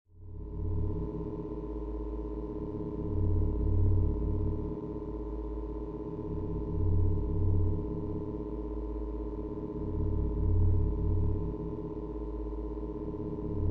designed drone dark deep static electricity mysterious suspense short; epidemic sound